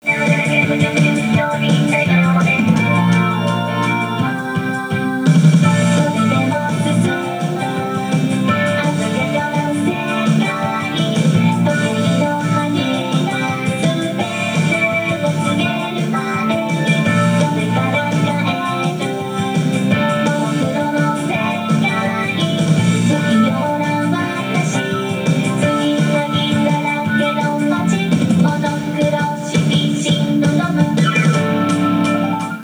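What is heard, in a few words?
background music karaoke; yakuza ryu ga gotoku kiwami 2 pc gamerip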